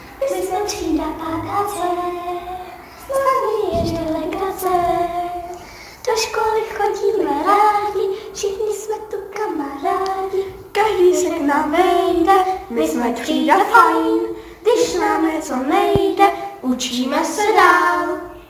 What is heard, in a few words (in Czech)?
zpiva